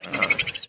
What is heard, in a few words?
run grunt, headcrab reviver